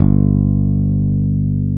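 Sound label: Fretless Octave, Electric Bass Fretless, Roland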